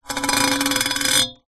foley metal serrated metal edge scrape on metal pan